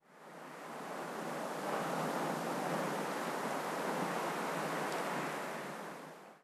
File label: wind gust